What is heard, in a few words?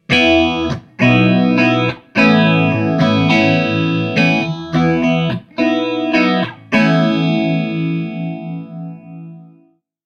Soft Rock, ringtones